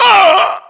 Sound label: death, english; voiceovers